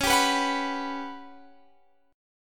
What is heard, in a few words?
G Major 7th Flat 5th